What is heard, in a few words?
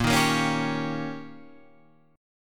D sharp 7th A sharp, guitar